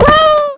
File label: Rabbit Die